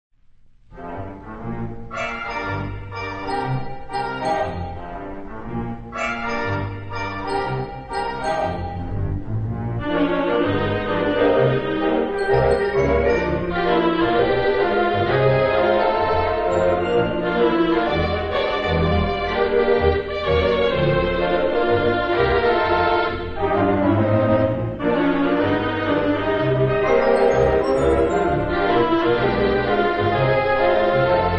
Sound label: pop organ, theatre organ; allen